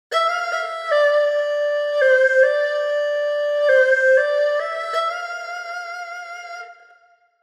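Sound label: Erhu Sustains Legato Sustains Ornament; Phoenix Orchestra; Valhalla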